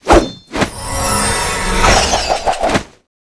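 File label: Attack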